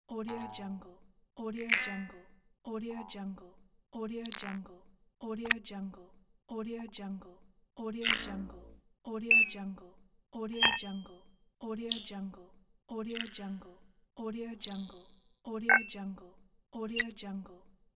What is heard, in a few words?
button scifi pack; volkovsound